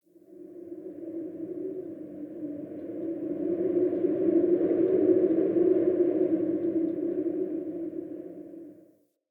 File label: Under City Wind Howl; Ambience